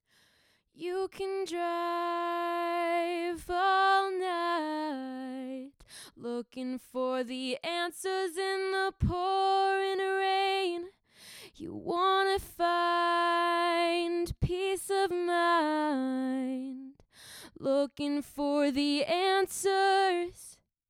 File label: SM58 singing sample